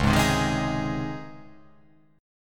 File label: C sharp Major 7th Suspended 2nd, C sharp